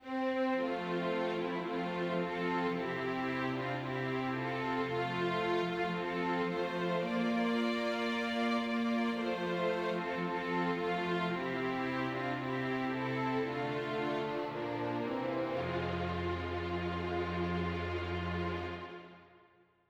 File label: Auld Lang Syne